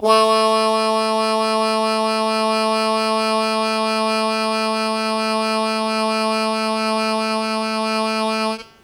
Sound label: L Sustain Fast Wah Wah A0, Harmonica; Zero G - Harmonica